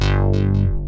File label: PEGGIATE, DANCE; Pro-Rec - Synthsonic; Z1 DANCE